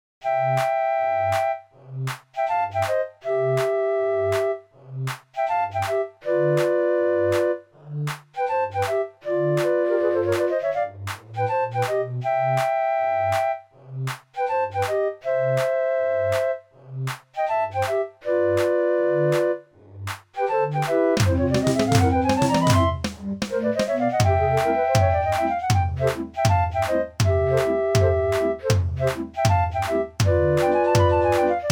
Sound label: jumbo commercial tune, draaiorgels